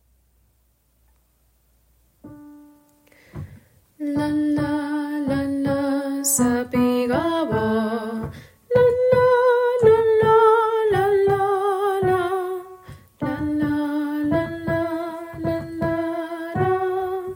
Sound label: bass